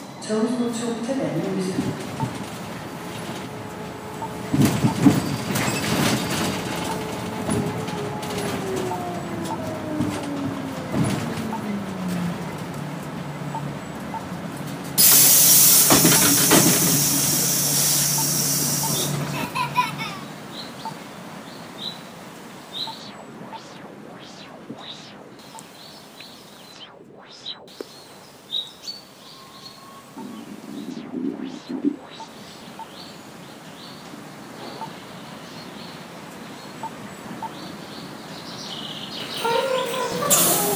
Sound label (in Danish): rejse